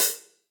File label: Hihat Closed Deep Snare Dynamics; Drum Hits; Ableton; Factory Packs; Session Drums Club; MPE Hits